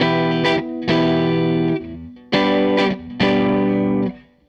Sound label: Gretsch Guitar Loop